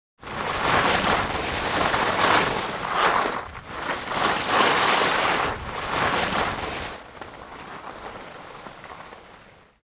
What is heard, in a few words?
Ski slalom down hill pass by close fast